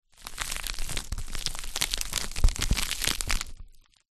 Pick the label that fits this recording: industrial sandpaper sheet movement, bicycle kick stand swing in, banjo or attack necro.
industrial sandpaper sheet movement